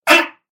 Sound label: agressive fart